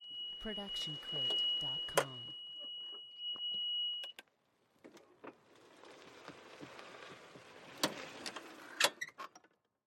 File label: golf cart reverse changing gear moving forward mono; soundscrate